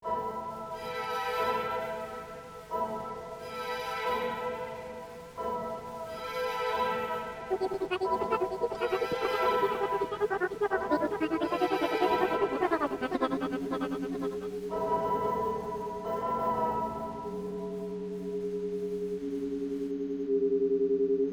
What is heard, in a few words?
Task Failed Chime